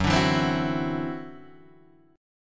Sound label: E 7th Suspended 2nd Sharp 5th Gb, guitar